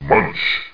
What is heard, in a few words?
Munch; The Ambrosia Times